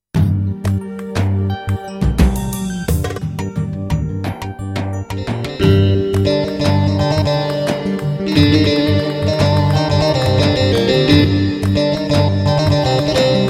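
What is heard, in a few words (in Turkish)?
GW8 elektro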